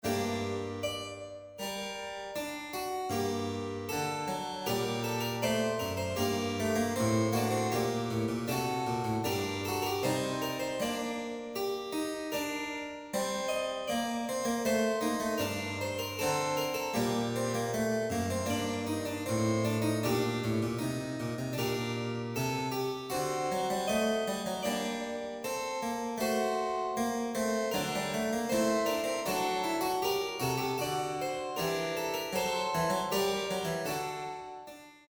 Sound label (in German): Fuge g-moll Engführungen; Fuge 2